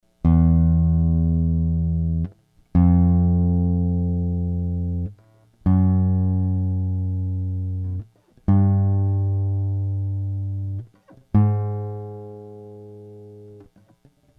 yamaha fishman, guitar

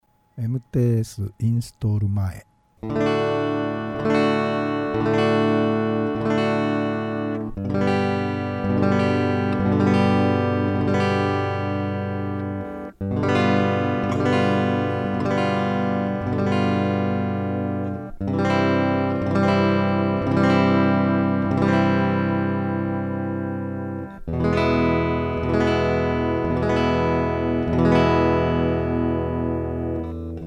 electric, guitar

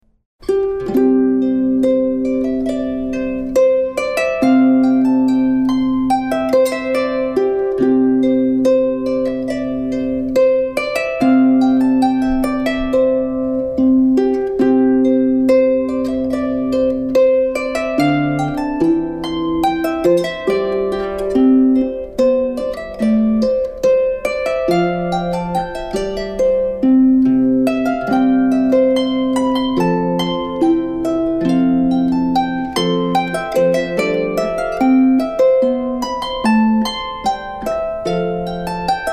harp; sonnet

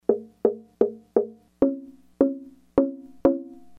AXL tap, guitar taptone

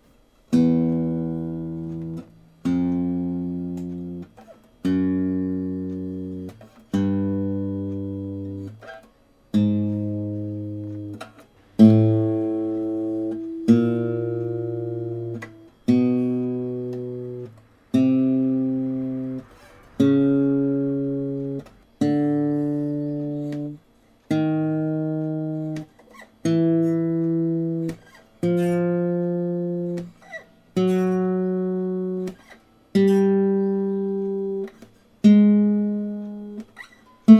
guitar vibration scale

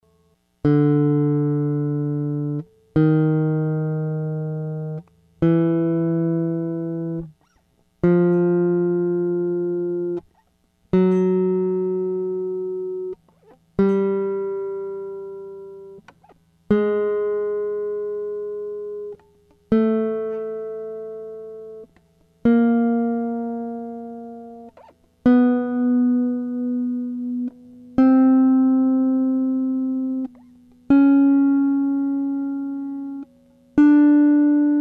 yamaha fishman, guitar

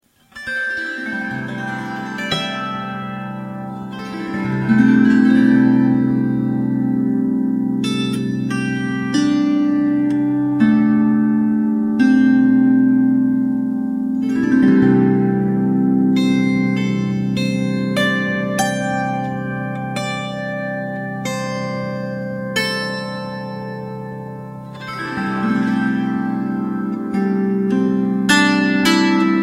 strummed

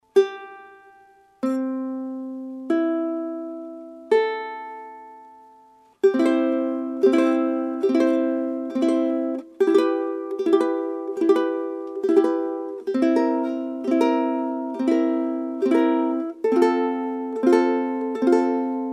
GString before, ukulele

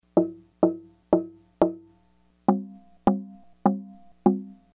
tap, guitar vibration, taptone; Garrison g30

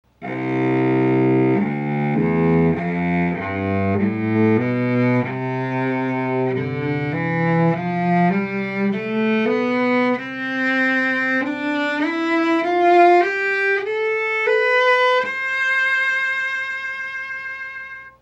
cello

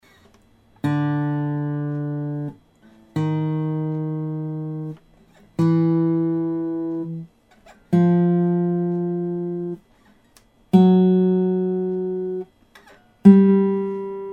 yamaha after, guitar vibration

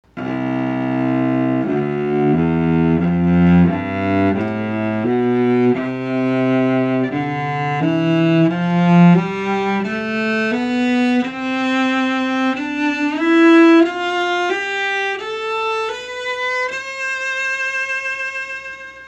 Carbon, cello, carbon endpin

